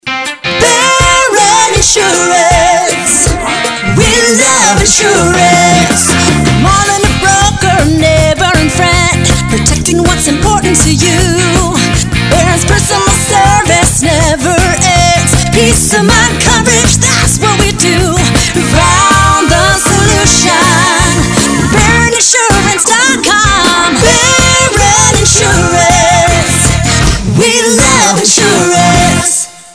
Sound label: Full Sing, insurance agency jingles; Baron Insurance